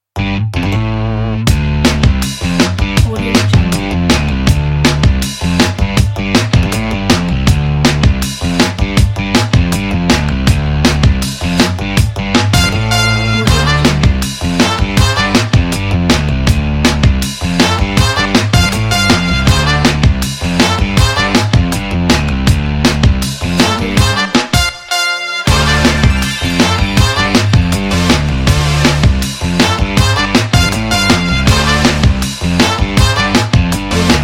the funk

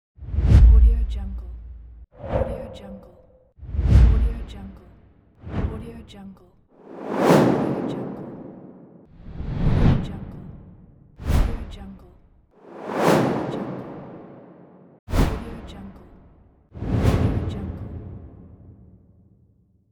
whoosh transition

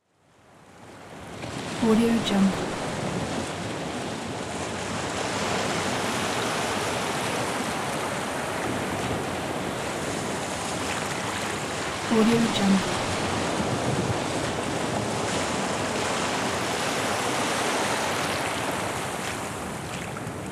calm ocean; elmiracle

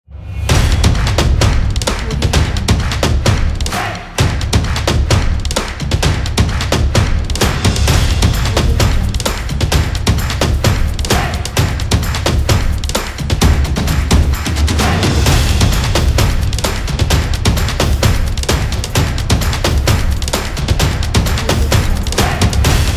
action percussion

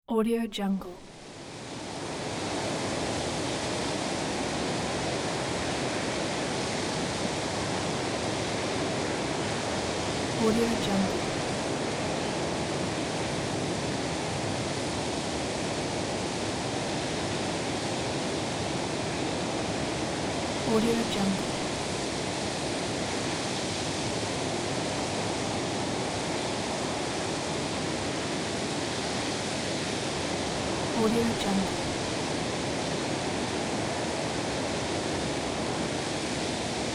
strong desert wind

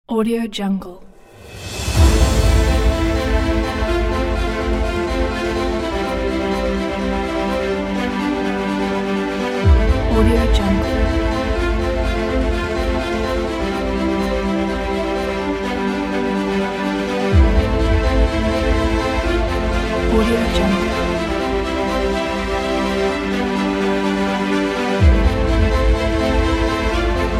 epic dramatic trailer

